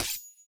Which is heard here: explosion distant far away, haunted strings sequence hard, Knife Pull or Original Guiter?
Knife Pull